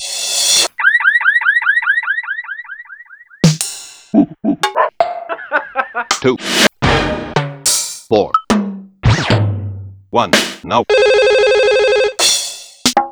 Yamaha DD-200; Sliced; m8

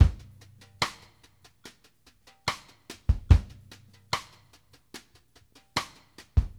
BRUSH + CLI, BALLAD 72; Sampleheads; New York City Drumworks VOL-1; SP BALLAD 72

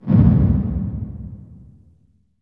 LH K909, REVRB LARGE HALL; TR 909

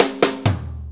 4-4 Fill, Drum Loops

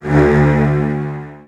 D1, Virtuoso Strings, 16TH; ILIO; Kirk Hunter; CL 16TH

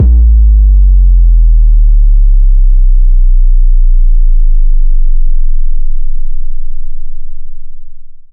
Sub Kicks, Bassdrums; Vengeance; Essential Clubsounds Vol.4

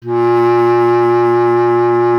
B. Clarinet; Miroslav Vitous - Solo Instruments VOL-1; 90 s Sample CDs

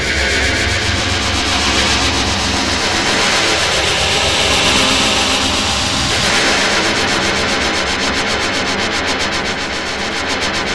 PPG SHIMMER, PPG WAVE; Zero-G Ambient 1

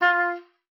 F4, OB D.AMORE; Xsample VOL-7

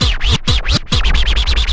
SCRATCH, Vinyl Scratches

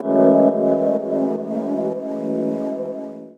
Chords Tones; Equipped Music; Loungin House

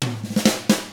127 Drums

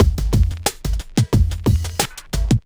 Smooth, R&B Drums

